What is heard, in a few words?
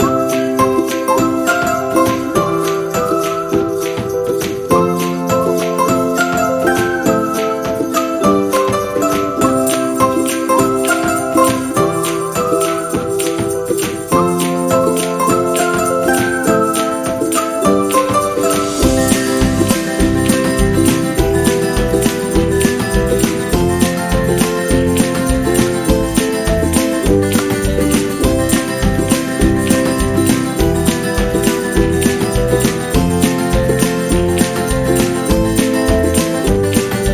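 Kids Vlog, Happy and cheerful background music for celebration videos, birthdays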